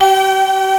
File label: BELVOIC; East West; Global Sounds